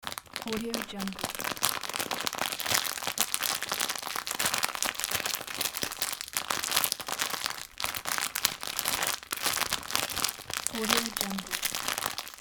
biscuit bag rustling

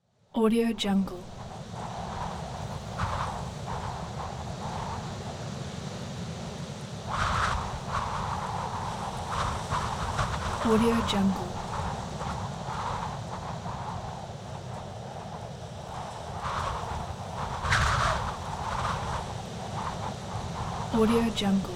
blustery wind; capeaudio

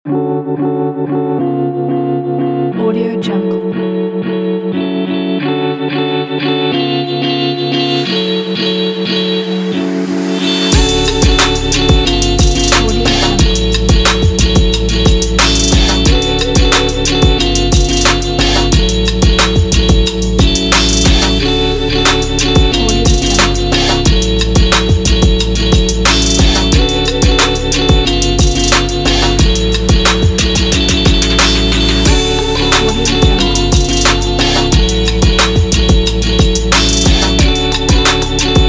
hiphop fashion

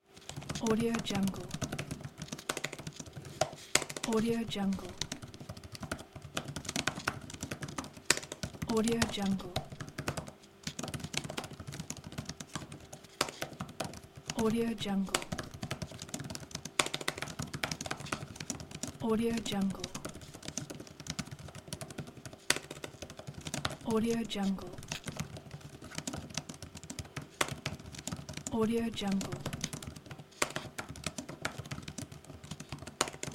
typing on laptop keyboard intensively

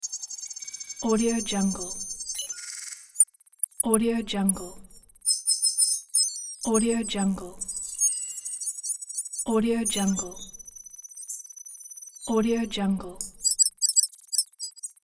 hightech device loop

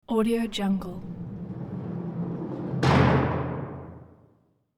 jail cell door open; sound ideas

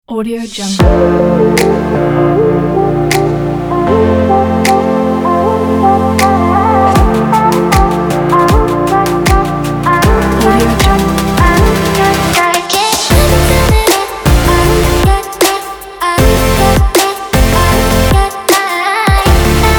summer pop uplifting energetic party

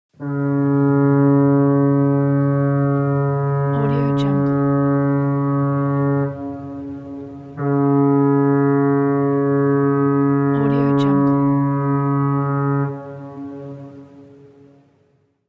boat horn

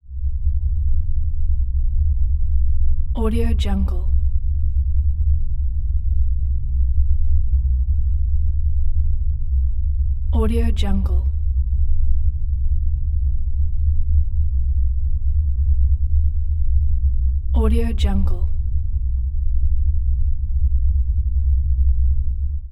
low rumble ambient

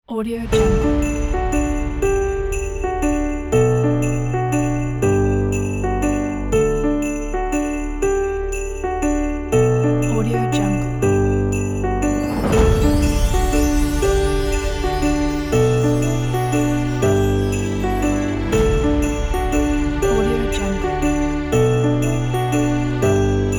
christmas piano

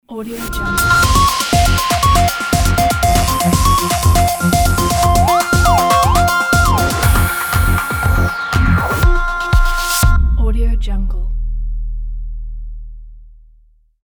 high technology intro ident

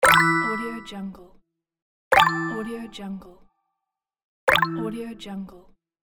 app confirm

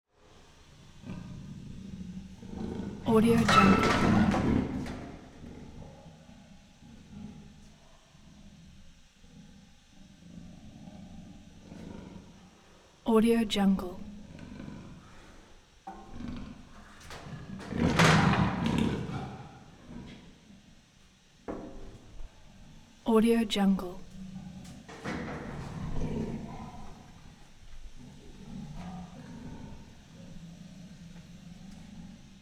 leopard in cage nasally growls hisses; prosoundfx

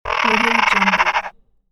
low monster sniff

